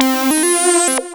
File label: lead (brass)